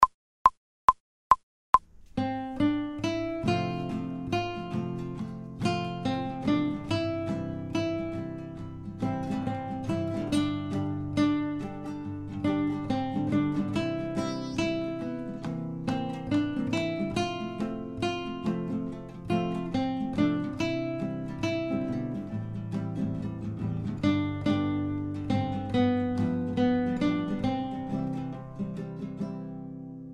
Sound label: fast, guitar, without metronome